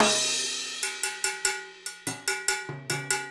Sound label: Acoustic Percussion